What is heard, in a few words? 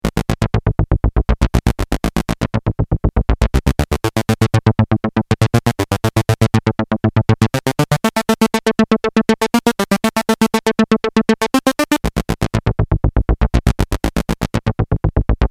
synth